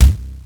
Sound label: Bass Drum, Cwejman S1 MK2, Drum Machines; 300 Drum Machines